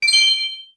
Get Rupee

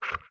Wolf Huff; Wolf Link

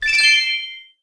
Get Rupee Blue

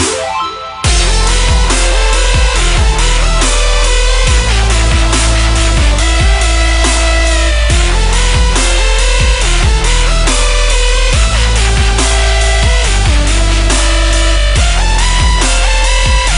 Dubstep Remix

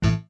Error

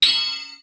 Item Menu Assign

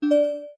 Pointer Onscreen

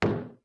Box Drop

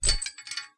Lantern Rattle

